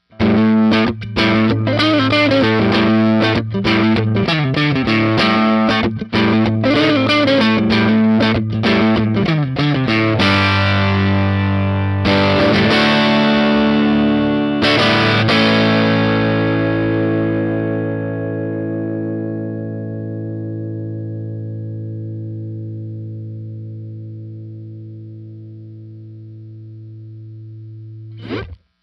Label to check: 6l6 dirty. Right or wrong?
Right